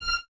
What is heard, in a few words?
Violins F 6; Miroslav Vitous; String Ensembles; Detache